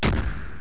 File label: slam